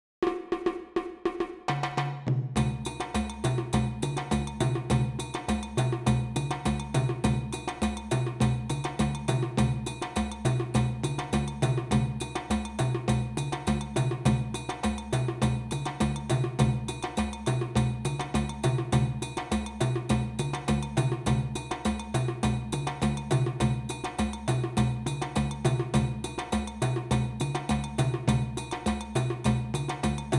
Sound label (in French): Moribayassa, percu